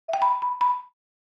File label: Marimba alert message